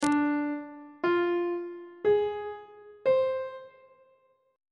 c aeolian 7th chord 2 is d half diminished 7th on treble clef